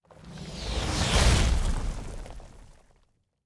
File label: Nature Spell, Magic Sounds; Fantasy Sounds Bundle